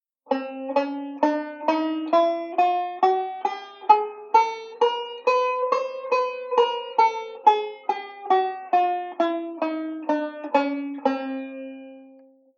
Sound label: Chromatic Scale; Beyond Chord Melody Volume II